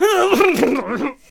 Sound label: Clubber Death Vocals